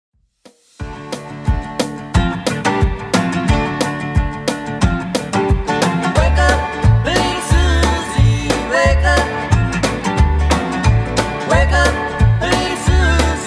50s Redrum 179 bpm

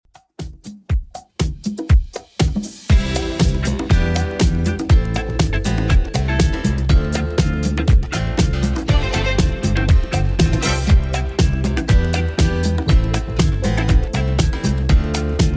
70s Redrum 120bpm; remixplanet

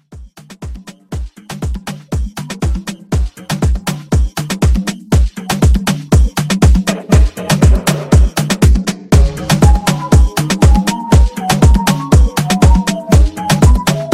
Latin Club

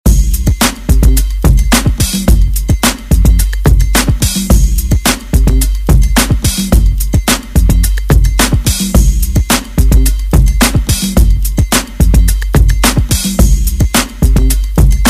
Kpop Redrum Clean

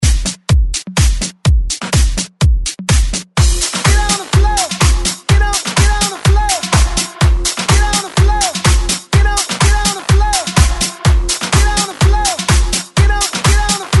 Hype Re Drum 125Bpm; DJDOLLS

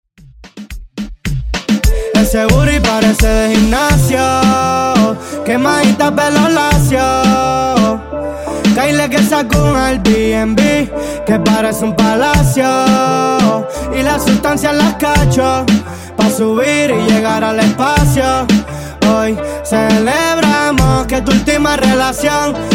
Transition 128 91 Bpm

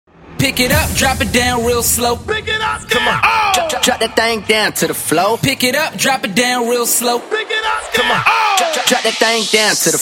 Moombahton; Mainstream VIP

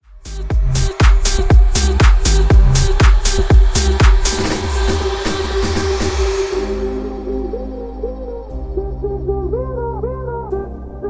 Clubbing House 120bpm

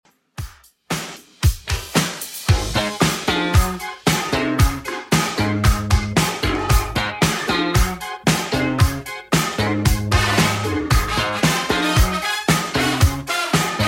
80s Redrum 114bpm

